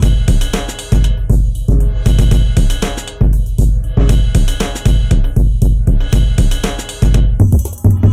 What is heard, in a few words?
Beat, Drums, Eclectic Electronica